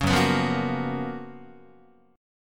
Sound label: F sharp Major 11th Guitar; F#M11 Chord; strummed